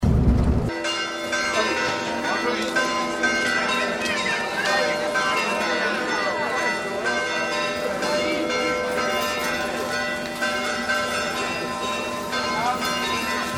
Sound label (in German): Markt L Aquila